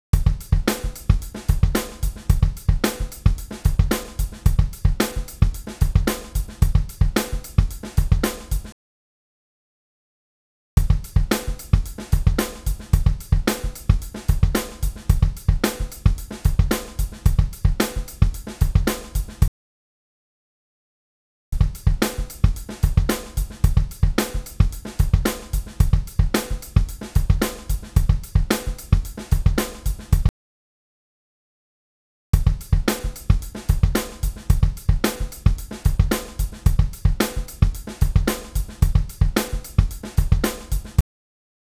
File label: drumloops comparison